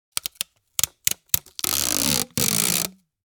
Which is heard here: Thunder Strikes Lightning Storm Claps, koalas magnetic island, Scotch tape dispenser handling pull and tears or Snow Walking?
Scotch tape dispenser handling pull and tears